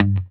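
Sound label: electric guitar note mute G3 left; Sampleheads; Whole Lotta Country 1 & 2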